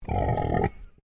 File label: Animal Pig Landrace Growl; Alice; Edgar's Mission Farm Victoria; Pigs; Animals